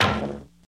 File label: Impact Room Ambience